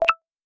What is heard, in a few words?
notification